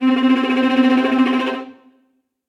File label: Long Tremolo String Quartet; Instruments; Individual; String Quartet by Spitfire Audio